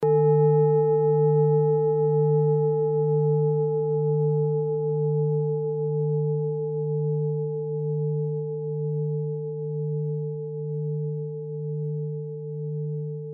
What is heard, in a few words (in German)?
klangschale ladakh